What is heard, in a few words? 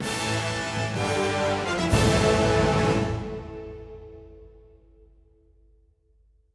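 Music Boss Theme Bpm128 End; asterix obelix slap them all 2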